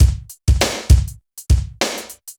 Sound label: Ambience, BPM100; Ambience Loop; AKAI S6000 CD-ROM - Volume 4